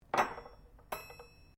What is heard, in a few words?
cutlery general place bench, Household cutlery; Kitchen